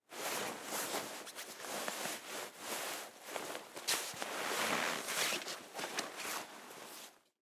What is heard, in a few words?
Hockey Pants On, Hockey Pants Put On; SPORTS; Hockey (Sound Effects:Sports)